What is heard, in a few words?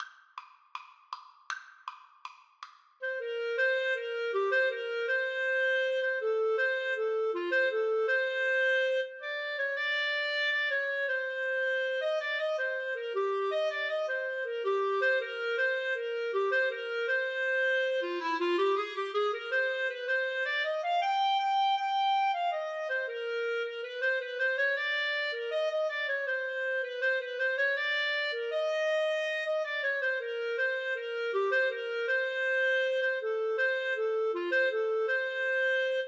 Clarinet duet